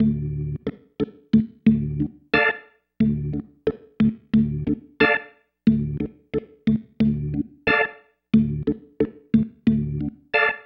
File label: Pattern, Organ